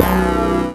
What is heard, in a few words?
Bass One Shot (B), Synth Bass